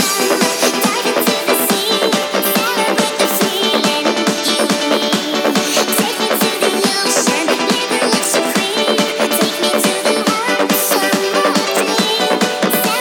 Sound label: Celebrate treble; Audacity